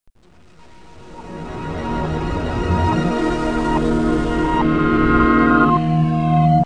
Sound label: Windows Shutdown; Windows Never Released Soundpack 2; deviantart